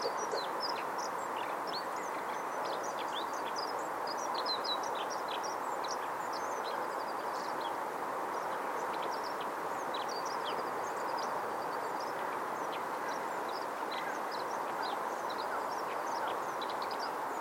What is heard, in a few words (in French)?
accenteur mouchet